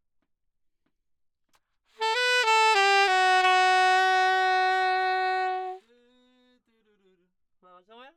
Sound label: Sax; Gefell